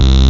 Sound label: NewTek Bass; Instruments